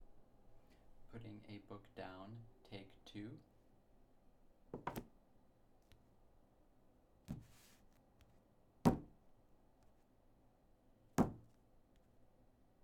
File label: Putting A Book On A Desk